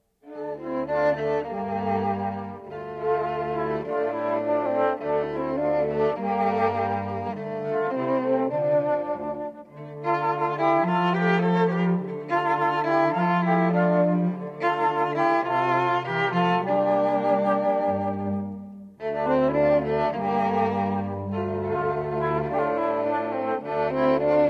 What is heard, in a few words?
Fl Trio; Cantilena South Florida